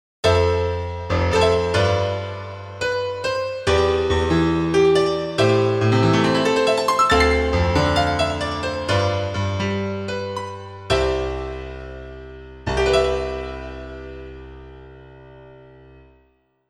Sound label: jingle